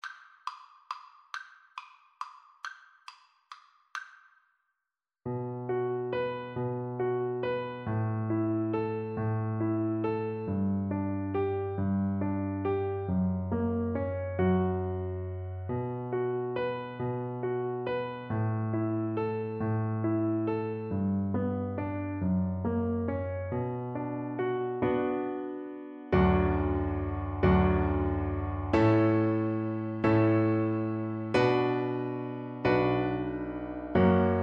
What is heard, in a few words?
greensleeves, Double Bass